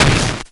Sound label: Perc Hit, drums; One Shots; Drums & Drones